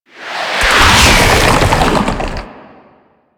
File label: Sand Spawn; Warframe; Man In The Wall; Enemy Gameplay